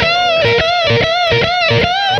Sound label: guit lead